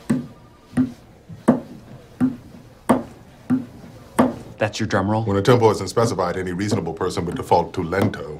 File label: drumroll; Drumroll (b99)